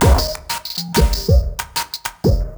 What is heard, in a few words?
FX Beat, Drum N Bass; Sonic Foundry (Sony Creative Software); Knutrix Drum & Bass